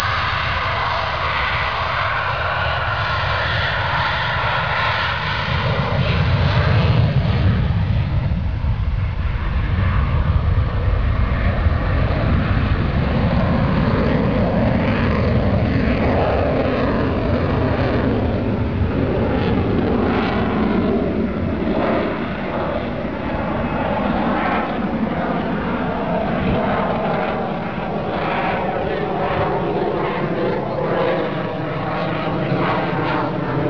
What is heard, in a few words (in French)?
bruit EF2000